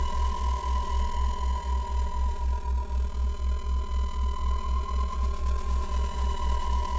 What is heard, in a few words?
NUKERAD, disasters